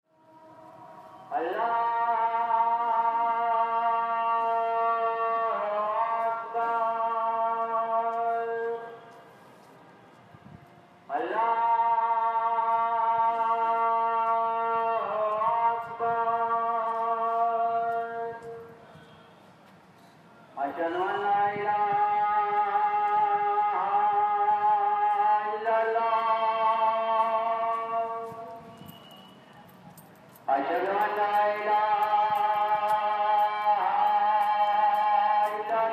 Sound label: Call to prayer from a local mosque